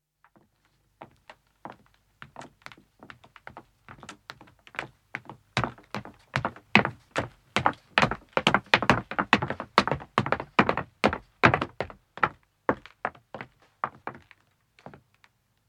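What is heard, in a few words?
Footstep wood descending, 2 men approach and stop; Footsteps (Sound Effects:Human); BBC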